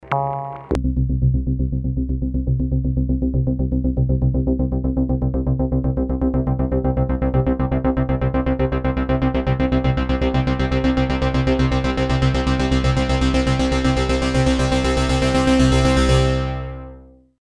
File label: hydrasynth polymorph